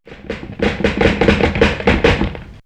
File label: Rattling Tree; X-Static Goldmine